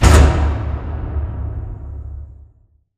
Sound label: sliding door lg verb close stop; Titanfall 2